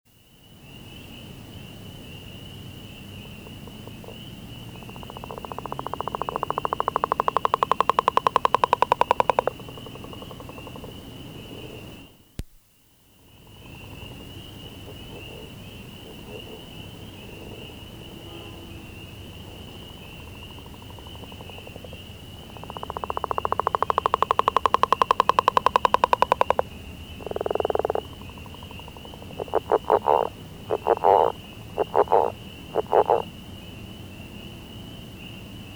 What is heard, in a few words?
Northern Leopard Frog Song